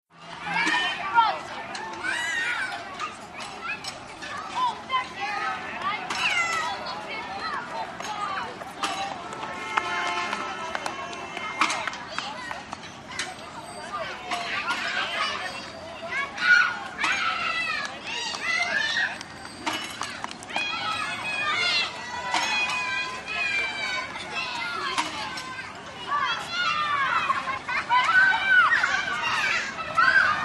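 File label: Kids Playground Swings; cheer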